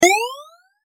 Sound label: Spring shoes Arcade; Doodle Jump; 3DS, Android, Arcade, DS, iOS, Mobile, Xbox 360